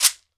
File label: SHEKERE, Drums&Percussion